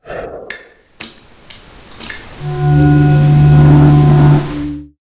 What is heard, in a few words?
Mega Beast Core Egg Die; A Robot Named Fight